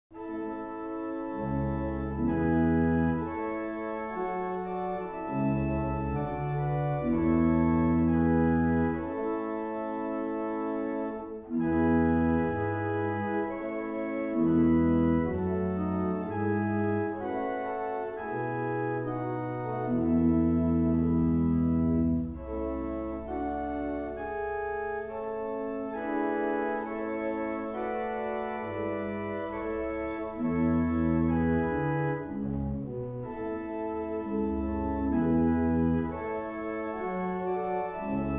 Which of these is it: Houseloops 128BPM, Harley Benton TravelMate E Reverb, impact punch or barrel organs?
barrel organs